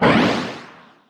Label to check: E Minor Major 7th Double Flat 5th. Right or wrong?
Wrong